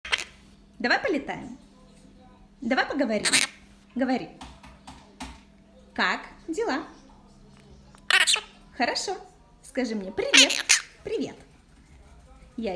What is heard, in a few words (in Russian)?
popugai monax kvaker kalita potesno razgovarivaet; myiopsitta monachus